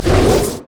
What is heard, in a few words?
sonic wave; LoL; leesin; blindmonk